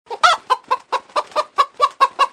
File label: chicken